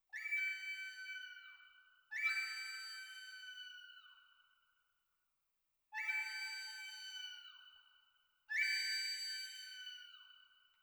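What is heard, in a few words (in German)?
Mini A moll Klangprobe, Flöte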